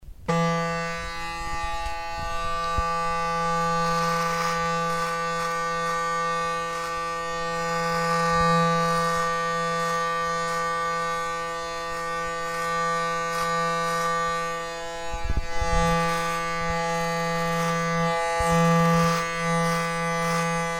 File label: shaver